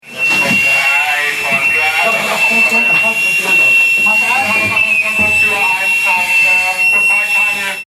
Feuerwehruebung wenig Luft

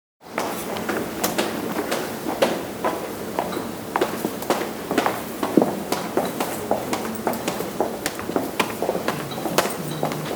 Schritte passage

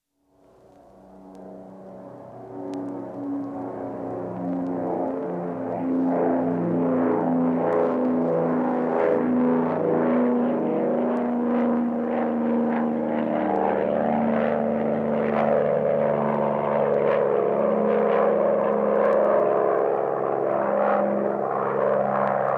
Luftwaffe Propellermaschine

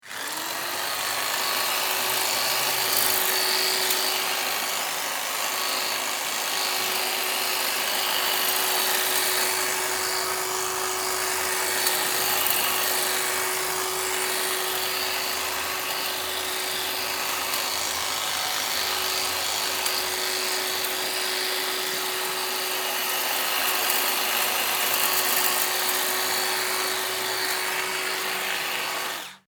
Heckenschere

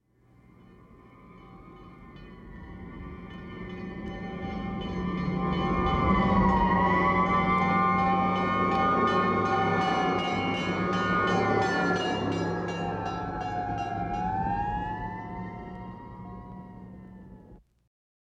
Grossstadtgeraeusche Vorbeifahrende Feuerwehr

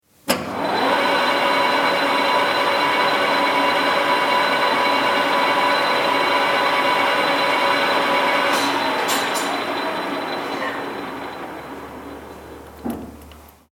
Drehbank

